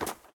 snow run, step; Minecraft; Remastered

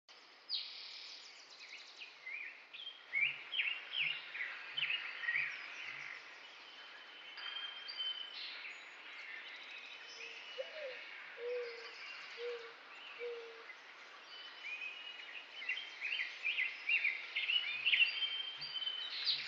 long bird, ambient

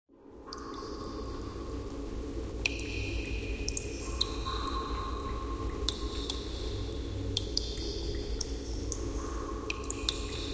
cave water, ambient underground

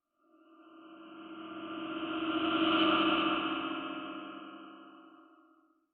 cave, ambient; Minecraft

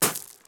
gravel land, step

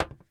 metal box run; blocks; step

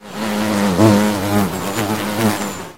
crawl, insect; ambient

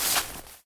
moss step; blocks

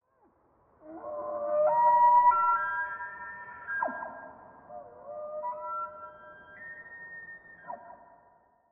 bugle, cervidae; ambient

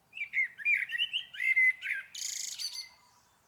bird, ambient

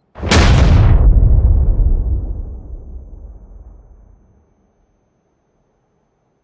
explode

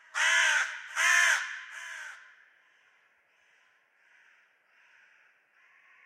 crow; minecraft; Remastered; ambient

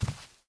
rug walk, step; blocks

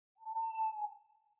owl

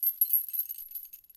chain, step

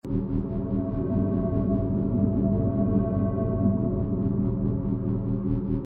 ambient, beacon; block; Minecraft

